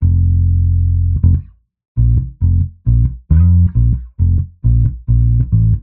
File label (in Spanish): BAJO; multitracks